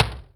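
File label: Distort, Electric Tom, Yamaha DTXpress; 300 Drum Machines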